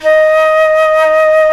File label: jazz flute